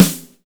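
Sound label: BUNDLE snare, drums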